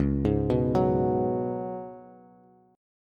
Db 7th Suspended 4th Db down Bass; JGuitar

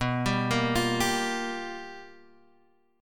G Minor 7th, B; Gm7/B arpeggiated; JGuitar

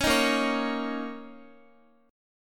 B flat Minor Double Flat 5th Guitar, chord; Bbmbb5; strummed; JGuitar

